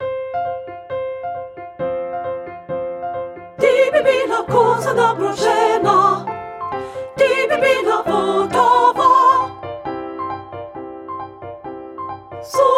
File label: soprano; soprano 1